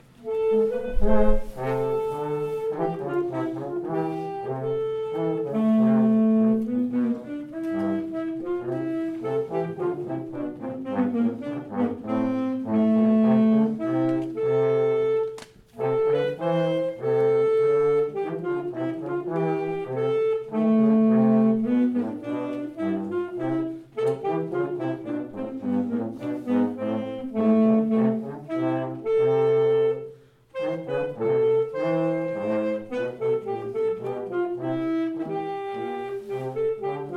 Hornpipe

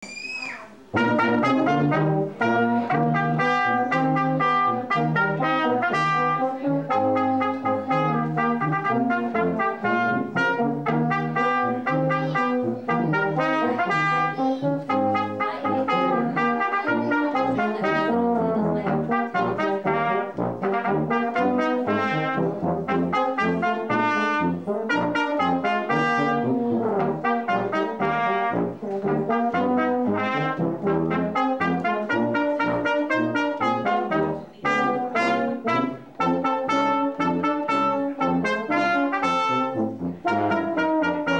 Jingle Bells, Brass

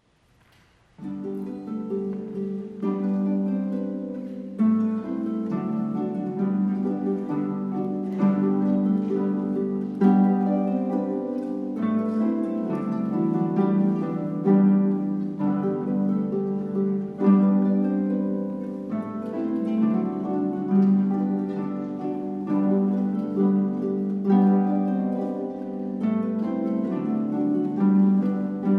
Symphony Orchestras Schottis

